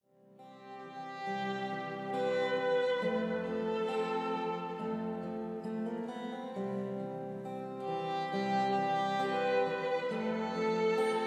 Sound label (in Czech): housle kytara